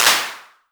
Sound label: Clap; EFM